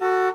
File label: small horn, Vehicle